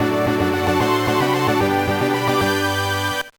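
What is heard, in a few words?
Jingle, video game; Futari wa Pretty Cure Max Heart Maji Maji Fight de IN Janai; GBA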